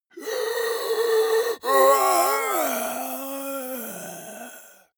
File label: monster die; kreaturen